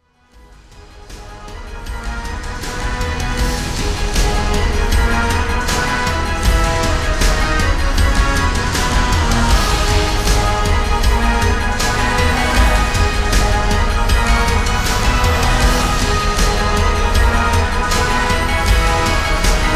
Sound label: instrumental